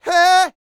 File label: Vocal Planet R&B & Dance; ILIO